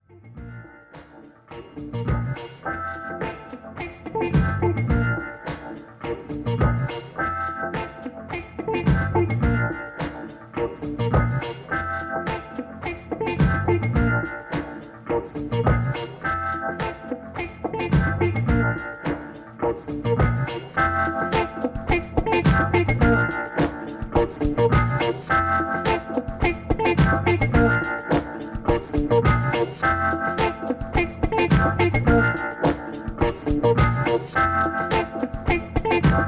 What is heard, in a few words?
Snowstorm Rough Music